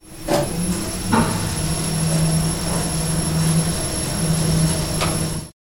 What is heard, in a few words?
elevator single floor wide; soundscrate